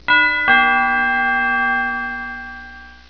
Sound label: DINGDONG